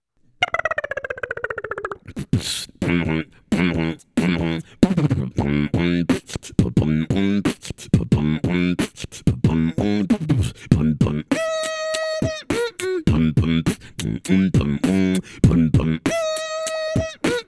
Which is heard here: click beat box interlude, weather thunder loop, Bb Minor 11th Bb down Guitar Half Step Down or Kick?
click beat box interlude